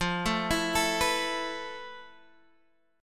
F Major Sharp 11th; FM#11; arpeggiated; x,8,7,9,10,7

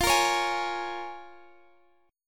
C sharp 6th F

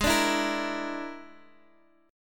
A sharp 7th Flat 5th G sharp

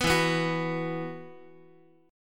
E Suspended 2nd, Guitar; Esus2 strummed; x,x,2,2,3,5

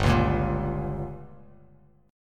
G Diminished, Bass Standard; strummed; JGuitar

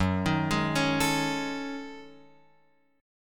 Gb Minor 6th Add 9th Gb Guitar Open F; arpeggiated; x,1,3,3,4,4; JGuitar

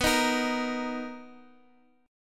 B Major 7th B down

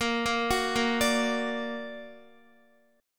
Bb Augmented Bb, Guitar; arpeggiated; JGuitar

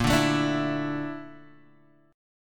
A sharp Major 7th Flat 5th, Guitar Open G

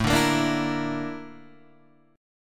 Bb Major Sharp 11th A, chord

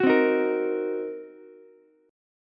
C Minor 7th Flat 5th C down Ukulele; strummed; JGuitar

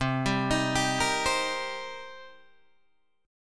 C Minor 7th C Guitar Open D

